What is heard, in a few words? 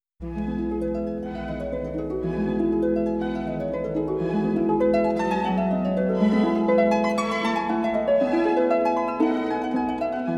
Harp Concerto first movement; Dittersdorf; Legend of Galactic Heroes; Galactic Empire SIDE